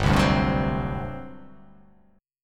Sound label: G Major 7th Suspended 4th, G, down